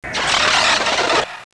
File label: explosion; kokomonds